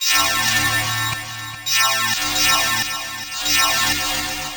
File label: Saw Guitar; Sonic Foundry (Sony Creative Software); Myths of Technology